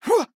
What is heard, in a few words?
Melee; Lúcio; overwatch